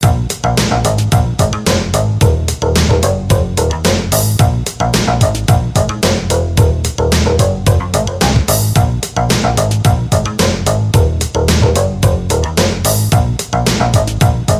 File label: ringtone techno, instrumental